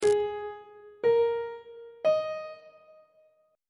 a flat suspended 2nd triad chord intervals on treble clef